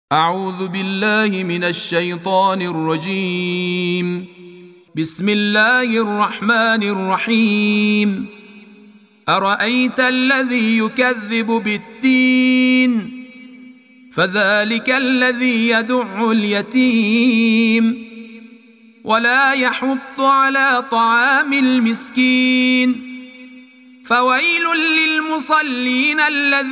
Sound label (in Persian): تلاوت ترتیل قرآن کریم سوره ماعون